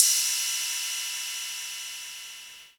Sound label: 808 Ride, Drum Hits; Zero-G; Soundclash